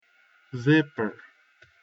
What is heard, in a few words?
zipper